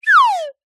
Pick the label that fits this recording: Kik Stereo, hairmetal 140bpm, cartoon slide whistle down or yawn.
cartoon slide whistle down